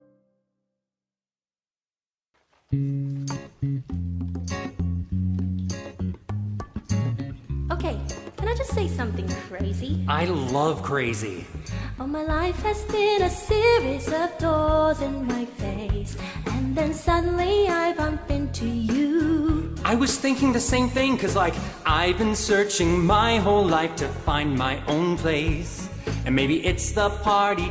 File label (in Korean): 영국여 노래